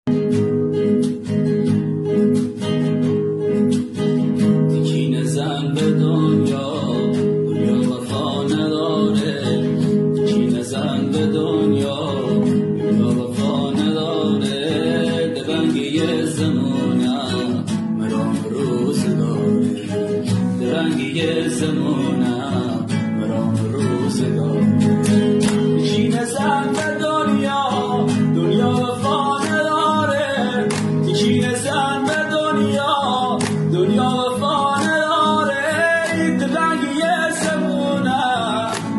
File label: guitar